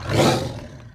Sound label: roar; TRUTH-HZ; Crowdsourced Sample Pack